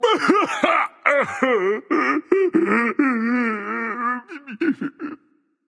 woohoo; smite; voicepack; poseidon

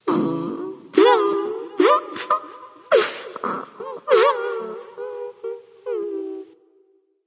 boo hoo; smite

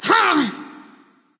grunt hit; bellona

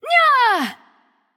battlecry light; smite; voicepack; hel

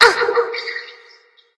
grunt hit light; smite; voicepack; hel

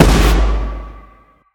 hit; smite; god scylla

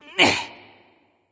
grunt jump; smite; voicepack; sylvanus

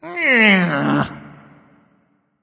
"ugh"; smite; voicepack; ullr

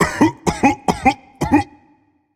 clear throat; smite; anhur

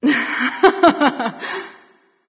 laugh; smite; neith

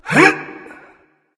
gruntjump; smite; voicepack; mercury

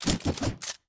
lance spin; smite; lancelot

